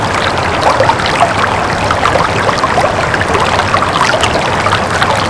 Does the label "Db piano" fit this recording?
No